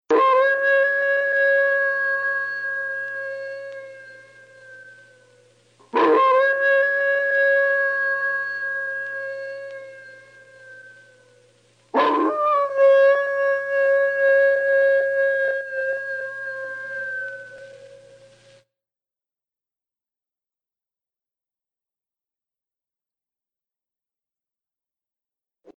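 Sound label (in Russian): вой волка; Страшные звуки для монтажа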